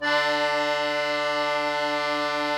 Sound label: KEY, Cajun Acord; Club-50 - Foundations Roland; Accordians